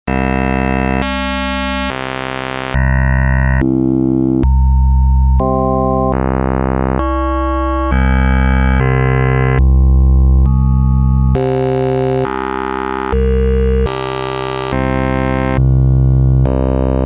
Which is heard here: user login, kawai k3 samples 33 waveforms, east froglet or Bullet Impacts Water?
kawai k3 samples 33 waveforms